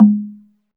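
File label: SLIT DRUM G#3 P; SLIT DRM1; Heart of Africa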